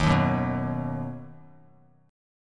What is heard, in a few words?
Eb Minor Sharp 5th Bass Standard; Ebm#5 strummed; JGuitar